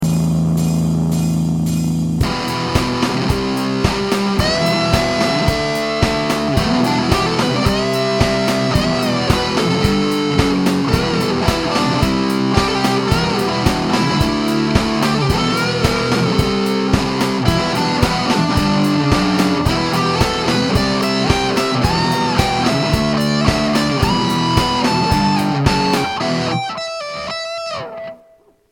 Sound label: heavy drums; Instrumental; generic guitar and drums